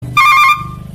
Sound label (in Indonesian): Rem Tulululut Truk